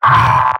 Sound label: pain sharp; Pyro; mvm